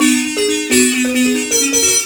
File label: BZ XY GRV, BUZZ XYLO; Spectrasonics; Heart of Africa 2